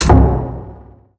stop; svencoop; underrain

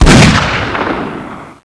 shotgun fire; svencoop; scshogo

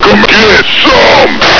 military attack; svencoop; echoes

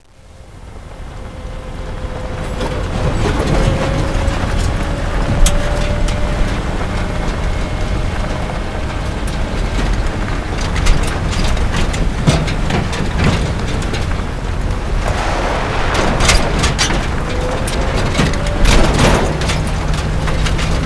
buzzer kamaz intro; svencoop; sence; mistake coop

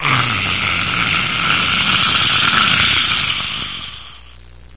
zombie idle; svencoop; custom monsters

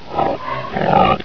houndeye pain; svencoop

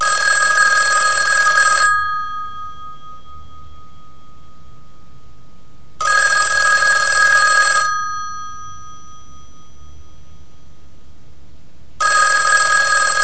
phone; svencoop; chntwn

